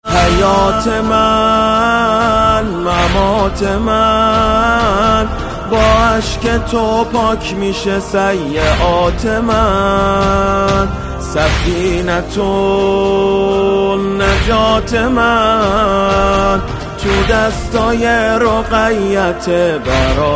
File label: ringtone